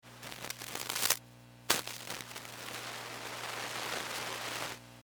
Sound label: Static tube amp ca1960s, Static, Tube Amp; Tube Pa Amplifier Static And Ground Hum , Bursts Of Noise; Static And Ground Hum, Thin